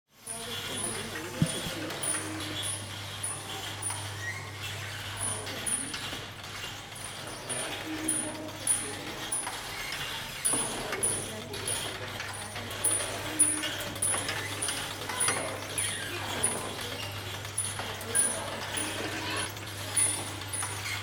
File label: Basel Tinguely Museum